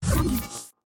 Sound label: Ship Teleporter Beam; Lethal Company; Windows